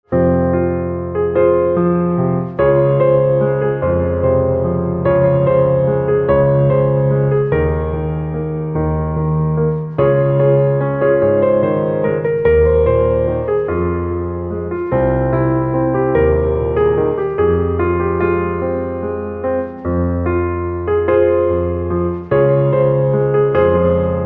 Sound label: Piano, instrumental ringtones